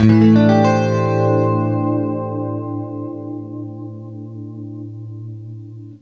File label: CLEAN AM9, Guitar; East West; Steve Stevens Guitar Samples Collection